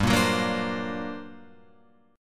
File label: G Minor Major 11th, Guitar, Open F; GmM11 strummed; 7,5,6,5,5,7; JGuitar